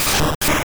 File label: Jump Kick; sfx; attack moves; pokemon; gen 2; gsc